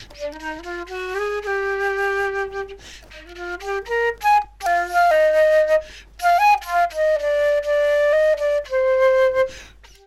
Jazz Flute

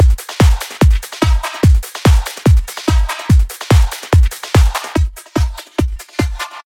Hardstyle

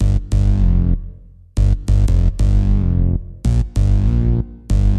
HipHop Bass

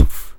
Beat Box Kick

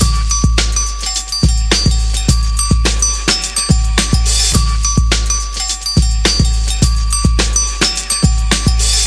Beat

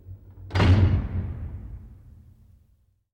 Jail Door Sound

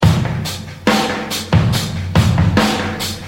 Rock

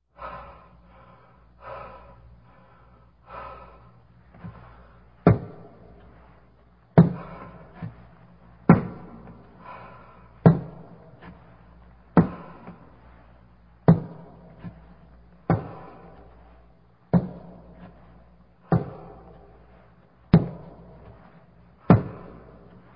Knocking

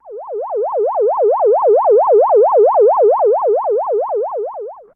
E-MU Moog Synth

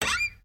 Hinges Sound

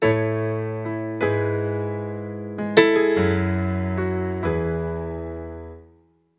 Grand Piano Ballad

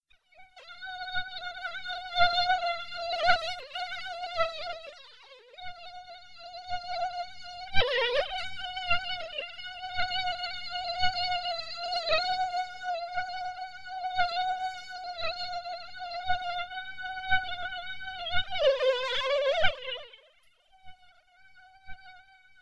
Mosquito Flying

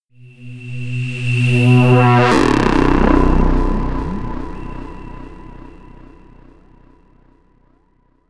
Old Synth B Sound

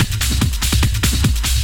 Hard Techno Drums

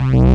Bass Hit

